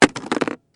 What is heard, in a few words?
Impact Plastic